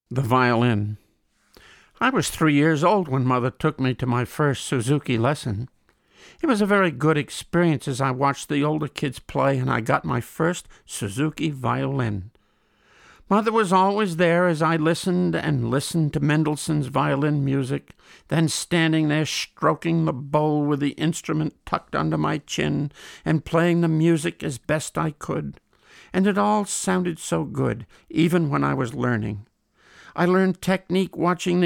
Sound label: The Violin